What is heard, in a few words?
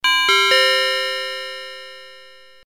metal; 金属音的; FM amount